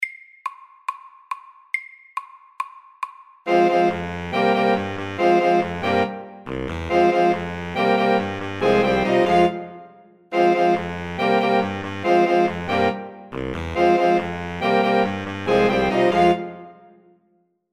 Jam FAST 140

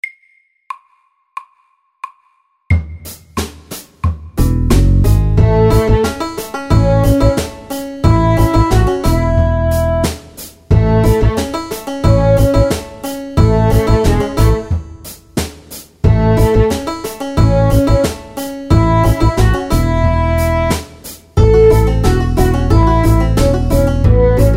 Bluegrass SAKTE

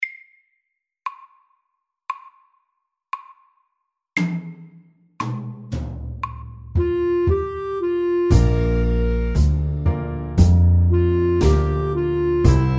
Slow blues sakte

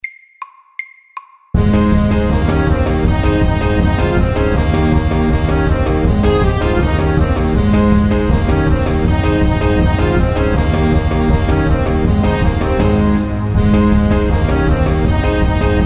Cancan FAST 160

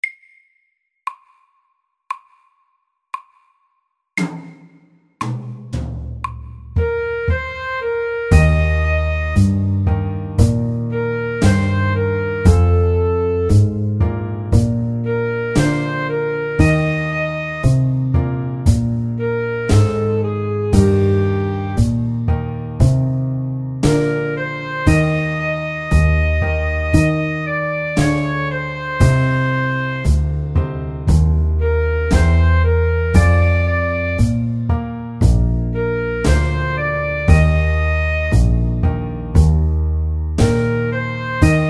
Slow blues sakte

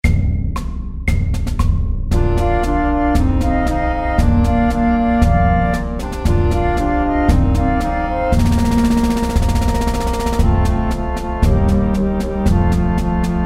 Aspirantmarsj FAST 116

